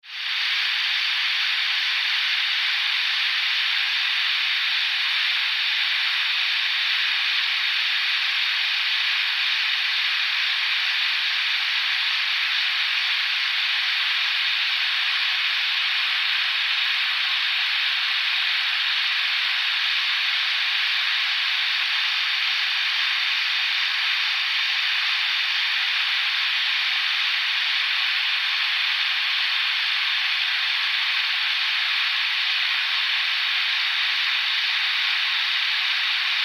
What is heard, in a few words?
Alien, Locust, Swarm, Scifi; 5.1